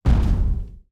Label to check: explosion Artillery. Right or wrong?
Right